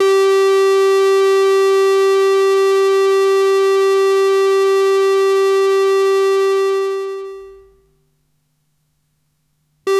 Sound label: chiptune